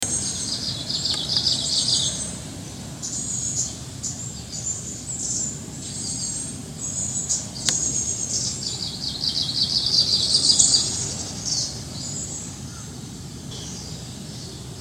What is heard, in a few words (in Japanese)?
oomushikui